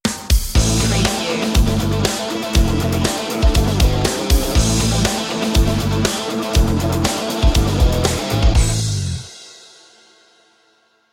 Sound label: big machines stinger